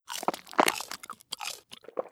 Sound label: eating